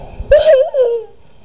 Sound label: laugh